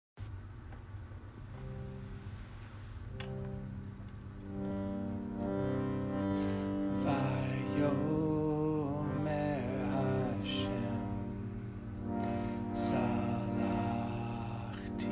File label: chant; salakhti